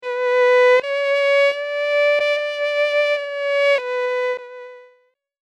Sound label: predicted Violin